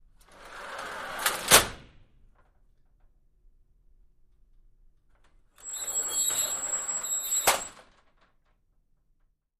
Door Accordion Sliding Metal; Single; Open And Close 3x, Slow, Medium And Fast; Metal Gate